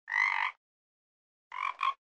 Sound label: frog croaking sound